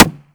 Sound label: wood, bullet collide; STALKER; IWP